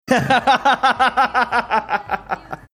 laughing

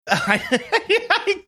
cute laugh

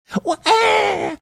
making baby crying sound

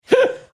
laughing hiccup

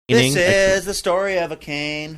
singing this is a story of a cane

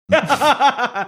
laughing

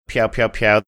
pew pew pew

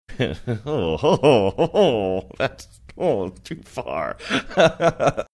laughing funny saying that's too far

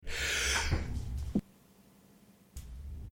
muting half of sneeze

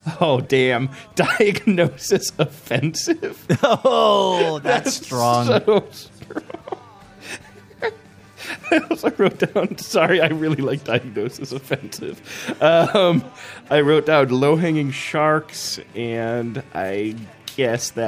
cant stop giggling; diagnosis offensive

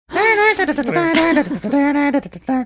singing

